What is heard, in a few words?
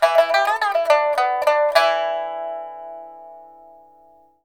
PIPA PHRASES; Spectrasonics; Heart of Asia